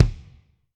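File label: Kick R and B Room, Alesis D4; Kik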